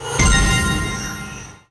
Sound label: nice win; Fire Queen